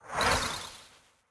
Miniboss Undead Jump

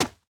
Player Footstep; gamerip; escape from russia mobilization

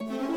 Crowd Traffic Jam Theme 160bpm Start; asterix obelix slap them all 2

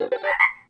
Lucky Drum Get Money

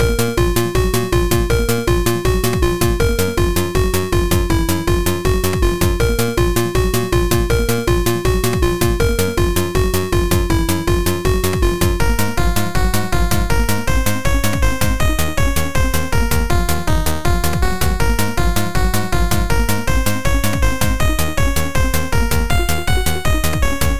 Boss Music; Adventure in the Tower of Flight

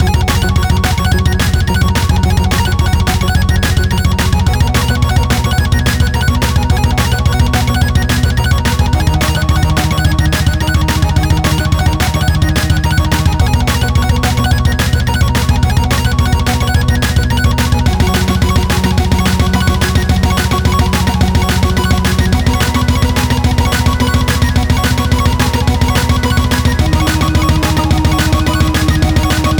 Music Metal

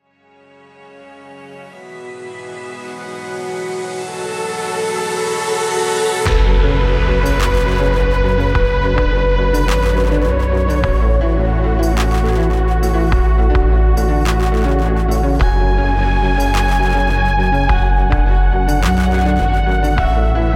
Production Music; Future Drive; Invicta Beam

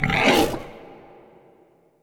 Pig snort; Super Mombo Quest

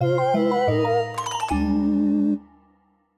Minigame Lose; AI The Somnium Files Nirvana Initiative

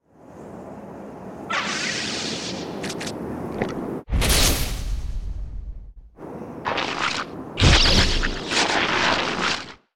Appear

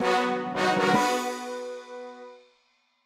Intruder Alert Show Jingle

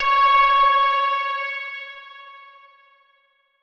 chime; chime sharp

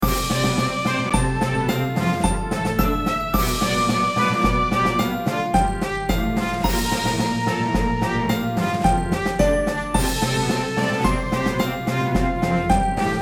Orchestra; Overworld

